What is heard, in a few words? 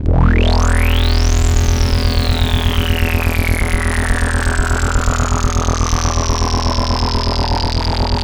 Roland C E1, Roland S100; musicradar-synth-samples